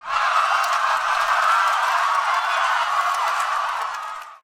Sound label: crowd happy; The Smurfs - Penalty Shout-Out; NextlevelGames